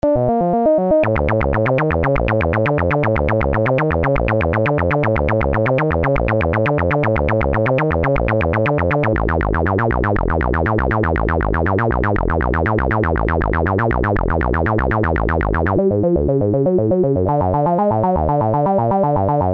303 morph

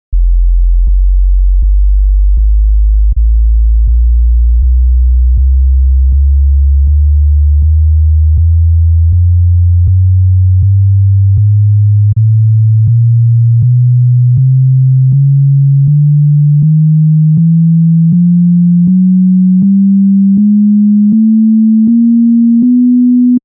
bass staircase test

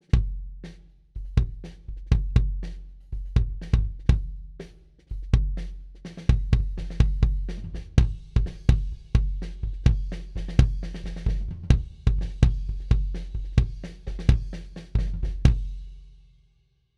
amb pa kick inside d112

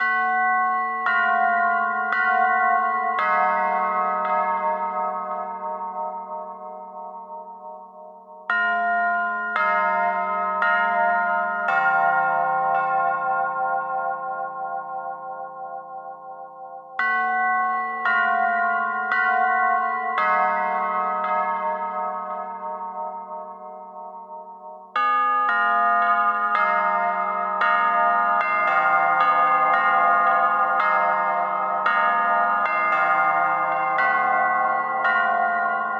bells processed

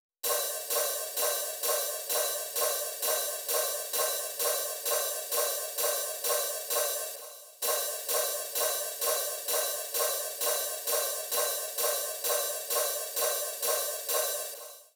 hihat processed

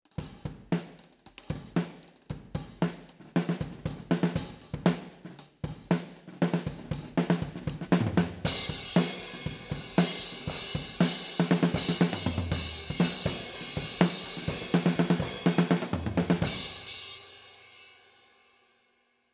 kick head off mid oh

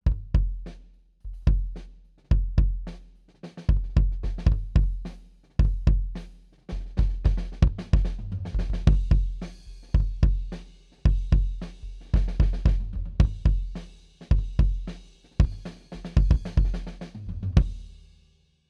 kick head off closed

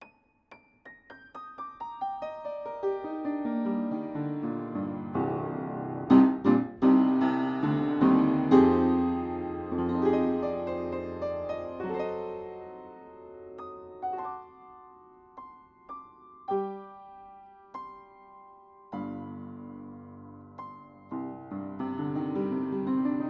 upright panels on inside pair

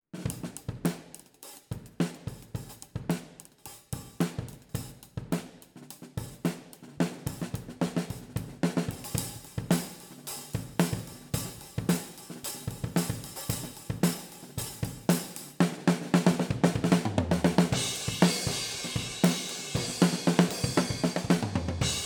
kick head on close oh

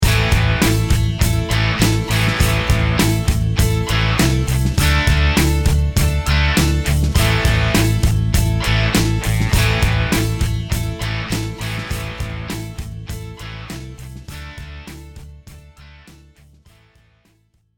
big guitar

